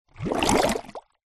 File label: nature water short bubbles bubbling air blow into water in plastic pot